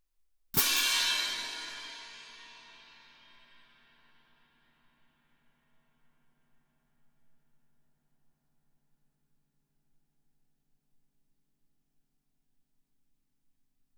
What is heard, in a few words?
18 orch crash, germanic, ff, crash cymbals; Percussion